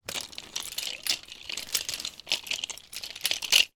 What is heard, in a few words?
toy pushchair movement; sound effects one